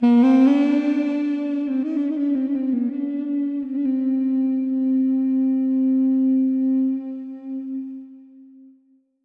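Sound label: sounds of a duduk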